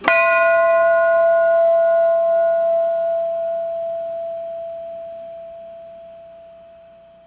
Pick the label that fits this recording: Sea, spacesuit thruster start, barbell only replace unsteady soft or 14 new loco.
14 new loco